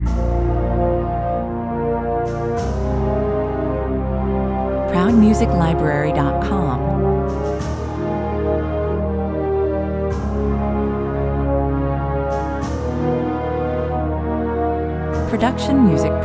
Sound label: courtroom entrance 96 bpm